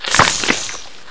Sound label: shock bite; shockroach